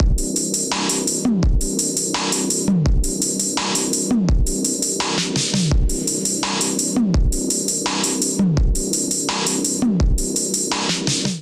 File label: Expo Kit Melodic 84 bpm, Drums; MIDI Clips; Drive and Glow; Ableton; Factory Packs